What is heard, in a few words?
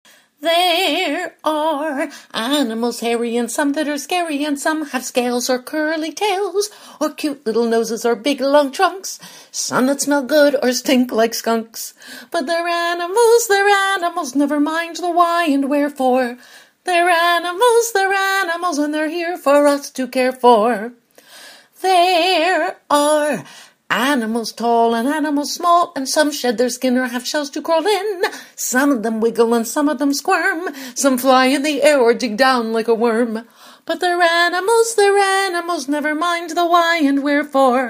Animal Jig